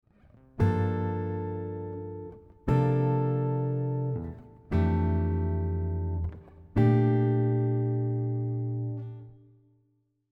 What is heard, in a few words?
Extended chords progression, guitar